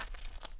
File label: Foot Tundra; Civ4 warlords